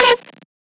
synth shot B; Electro; Anthemic; synth shots

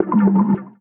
One Shot, Hits

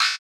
Glitch, One Shots, Glitch FX

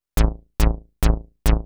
TECHNO BASS 140 A

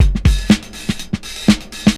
loop, 122 BPM

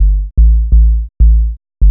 HOUSE BASS 125 A

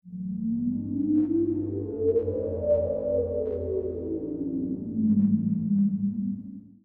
SPACE SHIPS; SpaceSounds

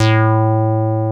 TB BASS; TB-303

